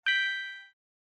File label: bottle Smash